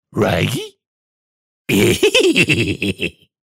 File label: Laugh; Scooby-Doo